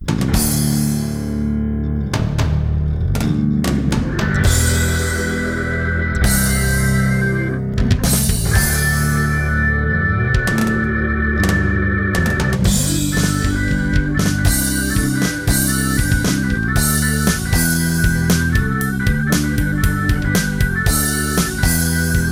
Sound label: male keyboards bass; music smorgasbord